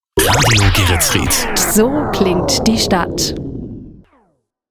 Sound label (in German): WERBETRENNER JINGLE; Radio Geretsried